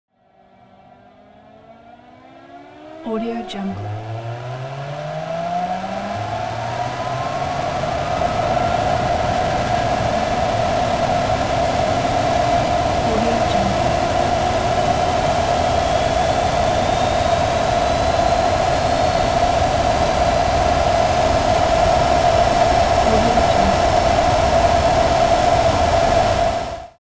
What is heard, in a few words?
transportation jet military flanker c turbine rev up